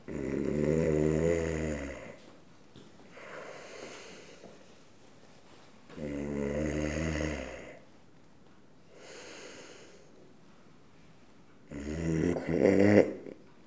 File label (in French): ronflement